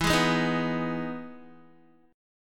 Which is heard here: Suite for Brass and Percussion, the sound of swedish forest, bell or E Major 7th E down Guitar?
E Major 7th E down Guitar